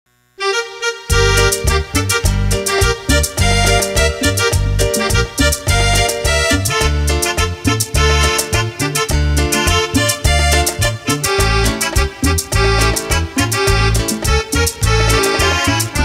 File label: Cumbia